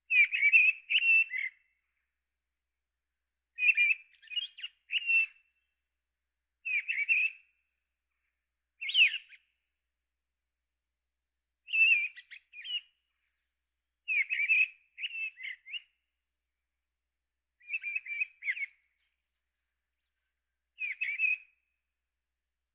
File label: Birds